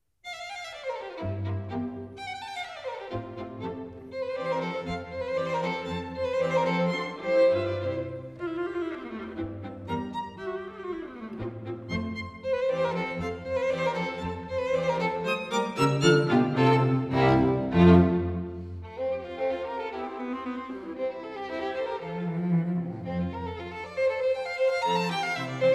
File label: String Quartet in F Major Allegro; String Quartet No. 1; Op. 18 No. 1; Ludwig van Beethoven; Beethoven - String Quartets Nos. 1-16